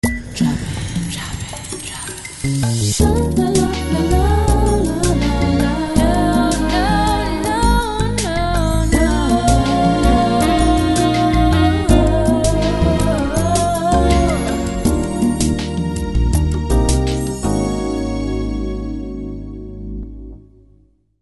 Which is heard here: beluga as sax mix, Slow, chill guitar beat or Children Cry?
Slow